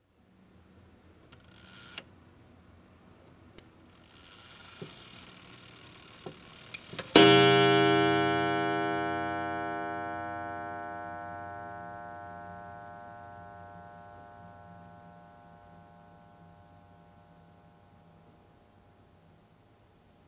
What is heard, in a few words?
Hour Chime; clock